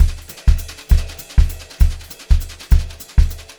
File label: 200 JZ BEAT2, Brushed Drum Loops; USB Soundscan vol.39; AKAI; 90 s Sample CDs